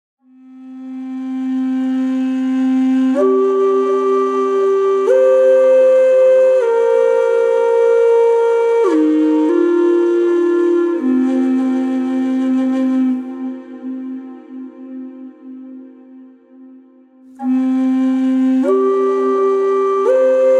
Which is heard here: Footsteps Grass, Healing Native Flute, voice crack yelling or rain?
Healing Native Flute